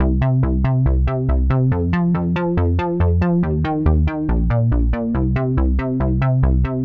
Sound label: House Chord